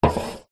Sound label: cola can open full set down slide table; food drink; zapsplat